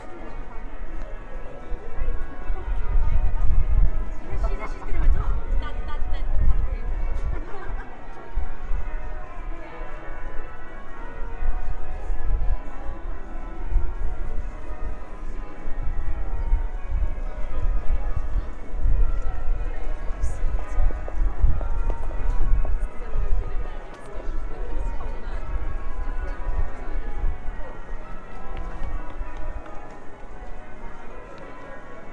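cathedral bells on very busy saturday afternoon in Worcester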